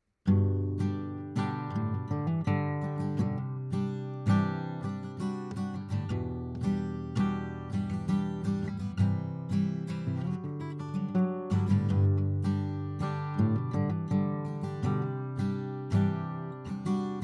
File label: SSGuitar Strum 15cm from tone hole; shure sm57